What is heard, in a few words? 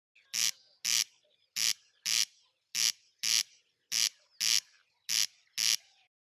Corn crake crex crex singing